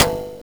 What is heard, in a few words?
Ethnic Perc; M8; Genesis